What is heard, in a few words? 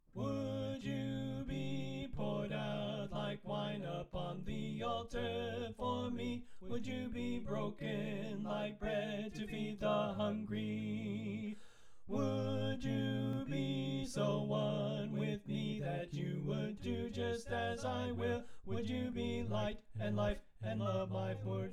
Tenor Left